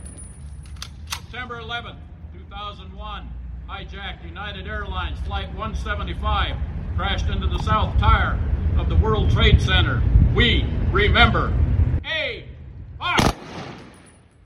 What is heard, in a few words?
SALUTE SOUND EFFECT